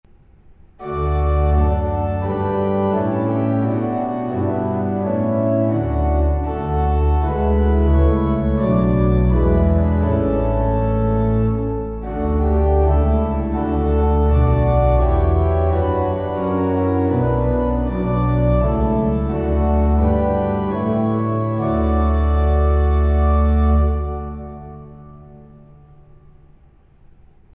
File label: ravensburg, hymns organ